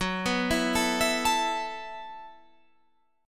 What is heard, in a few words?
A Suspended 2nd, chord; Asus2/F#; arpeggiated; chordshape; 14,14,14,14,17,17